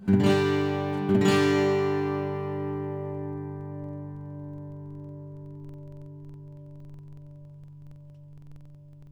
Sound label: Special Maple, guitars